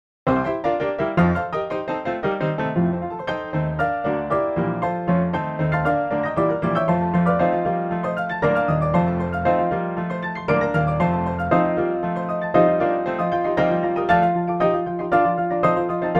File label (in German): Klavier Fantasie; Brahms; la Brahms piano gamberge (1:02